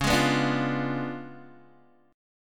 Db 7th Flat 9th, Guitar, Open D

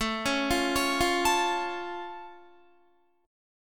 A Augmented A down Guitar; A+ arpeggiated

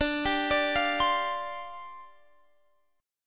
C Suspended 2nd Suspended 4th, D

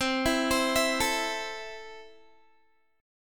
C 6th, Guitar; arpeggiated; 20,19,22,21,21,x

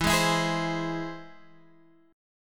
E Augmented; strummed; 12,11,14,13,13,x

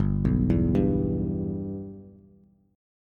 G 6th, Bass; G6 arpeggiated

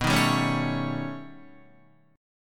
B Augmented 9th B Guitar, chord; strummed; JGuitar

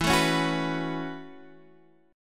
F sharp Minor 11th, Guitar

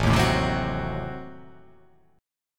A Diminished 7th A down

